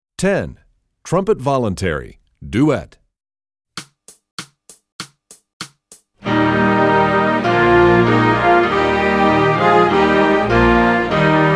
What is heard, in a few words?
Trumpet Voluntary, trombone; essential elements